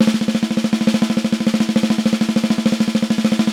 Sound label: Vintage Snare Drum